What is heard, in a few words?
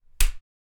Hard Slap Impact Sound